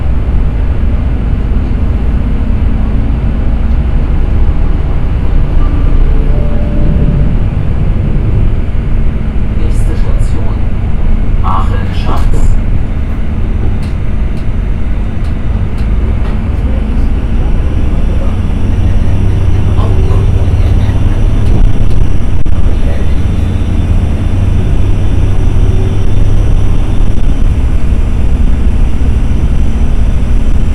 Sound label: Inside Train binaural, Background Noise; TS 103 224 Background Noise Database; SymmetricArray